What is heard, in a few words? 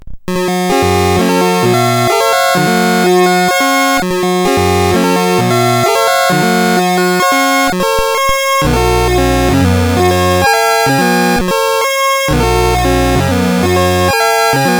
Mini Hoedown, CSG8580R5